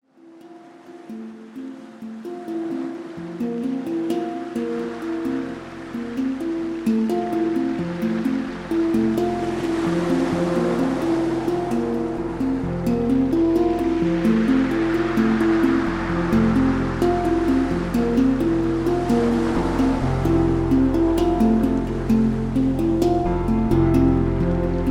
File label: Meditation Mix